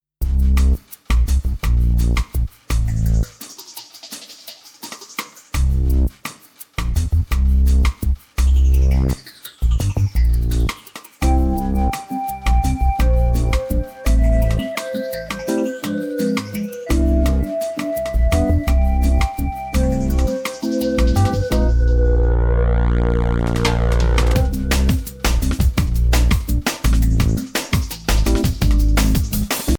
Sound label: partido alto